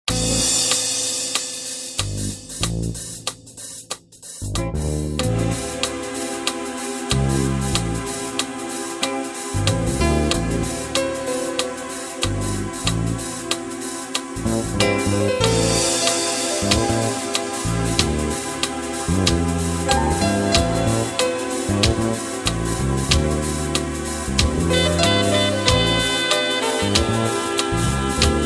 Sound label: Eezi Jazz